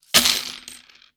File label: coins table